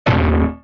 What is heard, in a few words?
metal hit